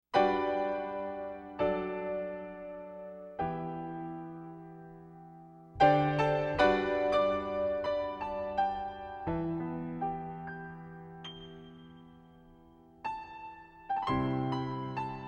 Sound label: Piano Reprise